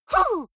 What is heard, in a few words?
female fight "huh"; vocals; comedy-cartoon; soundpool